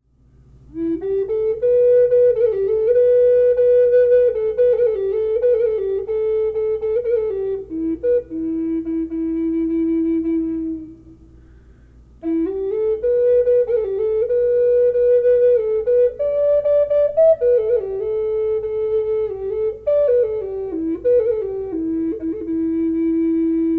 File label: quilted redwood reverb dolphin, flutes; collector flutes